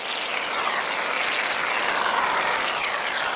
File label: Hoop Suction Slurping Loop